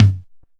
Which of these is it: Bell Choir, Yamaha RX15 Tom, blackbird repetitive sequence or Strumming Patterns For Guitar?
Yamaha RX15 Tom